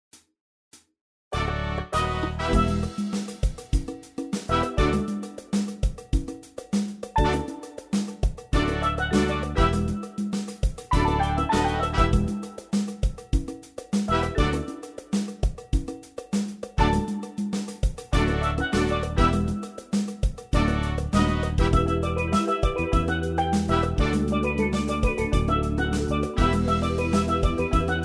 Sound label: conga